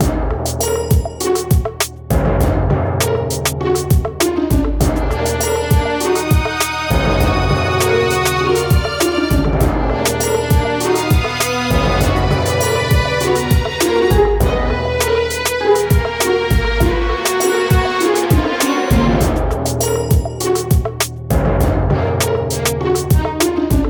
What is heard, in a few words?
Evil character; zoo hospital; wii